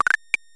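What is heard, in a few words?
Calendar Buzz; Treasure Galaxy (1994); Learning Company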